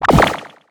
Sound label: butchered step